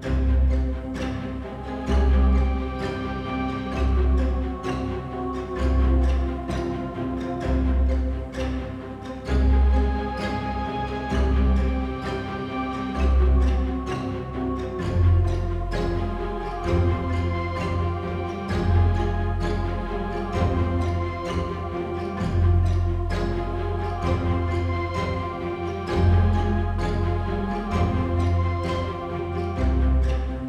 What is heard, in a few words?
combat Battle; XiuXianGame